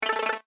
Computer Readout, Scifi, Computer, Readout, Data, System, Musical, Science, Fiction; SoundEffects-BlastWave